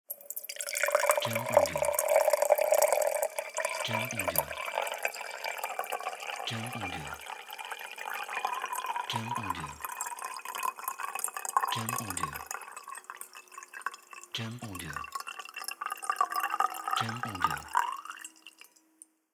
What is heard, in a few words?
Pouring Wine Long